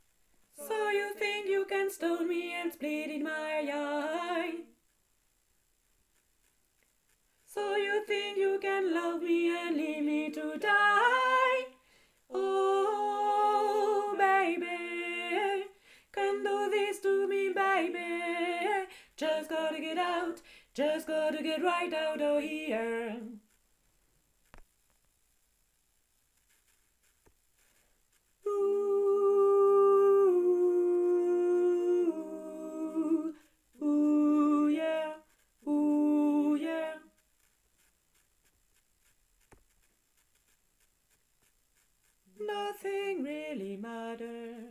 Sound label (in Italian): soprani